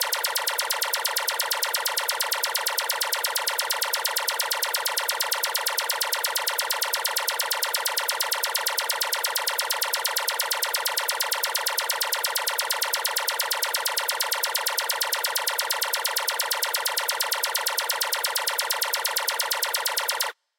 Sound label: big win increment; Back to 70s; wazdan